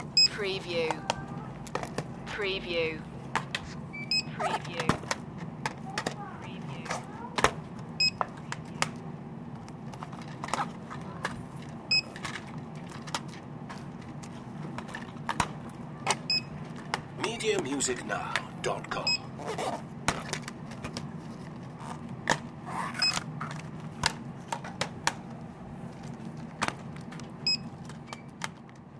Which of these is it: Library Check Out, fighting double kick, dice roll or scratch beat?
Library Check Out